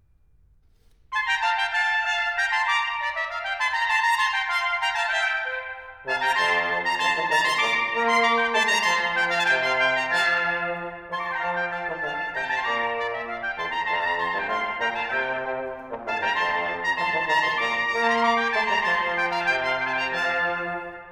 Volta, for 4 viols／violins & continuo, Arr for brass